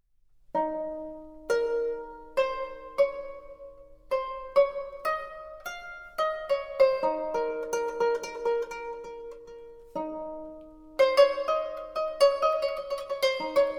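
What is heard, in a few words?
Gothic Harp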